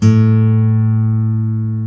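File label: GUI Ac. A2, acoustic guitar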